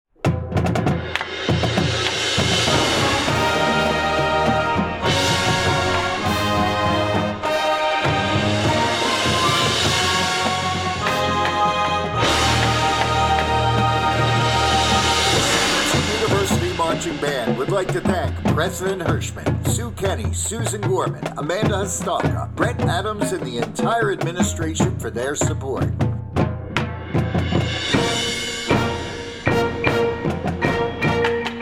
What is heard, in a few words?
Fanfare